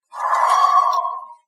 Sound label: banshee scream; phasmo